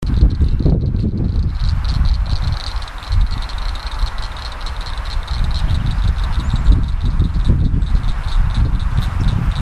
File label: Acris crepitans